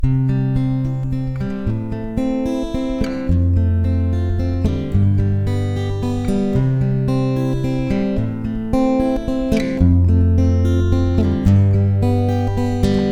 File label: I-vi-IV-V Key of C